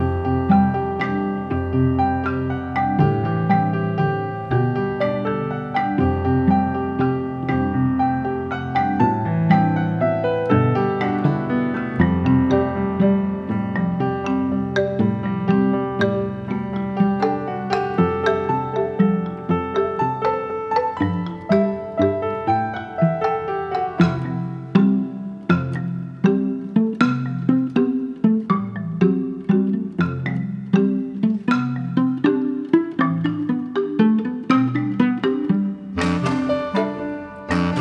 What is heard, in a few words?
Music Level Crash Layer